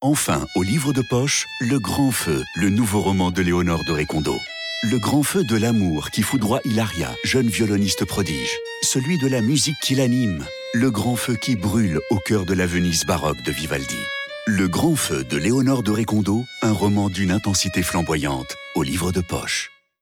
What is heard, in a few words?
music; HACHETTE; LEONOR DE RECONDO